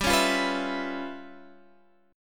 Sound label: Db 7th Sharp 9th Flat 5th G, chord shape